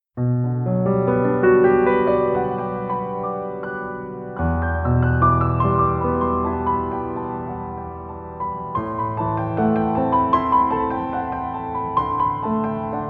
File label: Jingle Bells